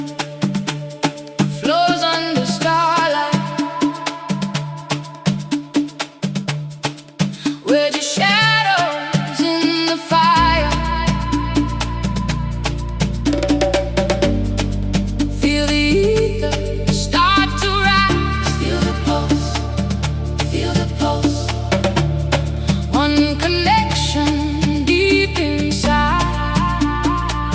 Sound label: Afro House Remix